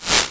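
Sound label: bullet left to right, nearmiss; arccw go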